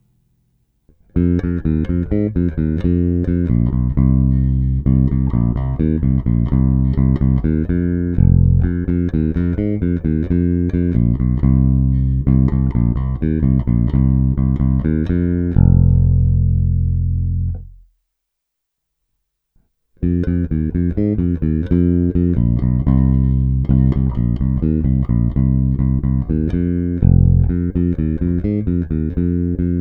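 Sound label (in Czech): Bonusová nahrávka s postupně zavíranou tónovou clonou, nejprve plně otevřená, pak přibližně na půlku, pak skoro zavřená, a nakonec úplně zavřená, baskytara; Harley Benton MV-4MSB Gotoh BM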